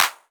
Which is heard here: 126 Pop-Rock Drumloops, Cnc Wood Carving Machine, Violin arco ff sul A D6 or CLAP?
CLAP